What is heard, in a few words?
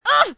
hit